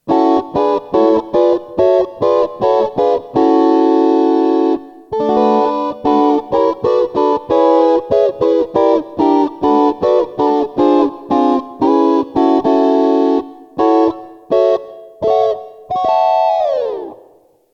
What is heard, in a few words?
Hex Block Closed, VG8 guitar sounds